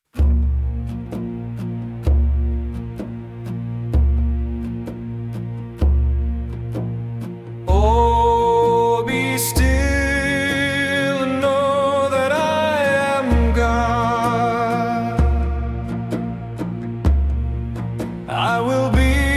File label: shofar calls